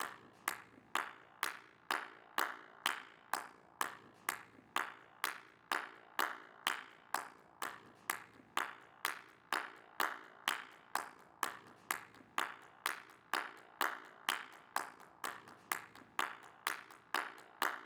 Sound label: Drums Intro Claps